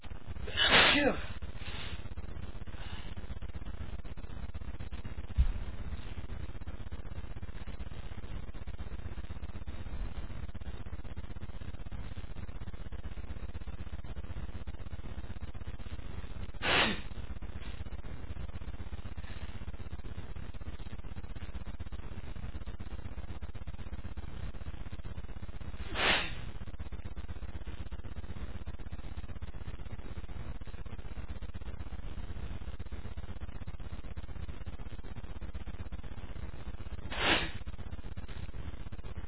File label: hayfever, male, achoo